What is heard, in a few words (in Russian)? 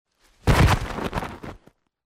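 падение в помещении; Звуки падения человека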